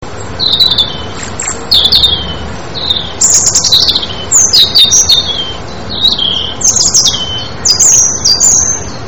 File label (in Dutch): roodborst; vogelsflevopark